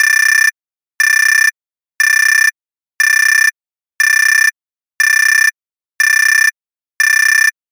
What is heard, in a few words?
alarm sound